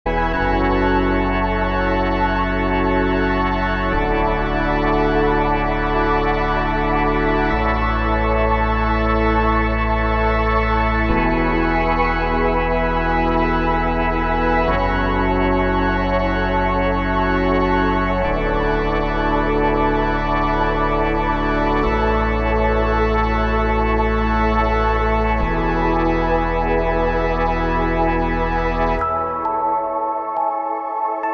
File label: ambient